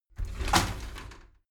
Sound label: shower slide door inside open heavy halfway